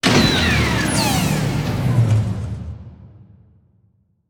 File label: Stop Monster; Se Solo Stop Monster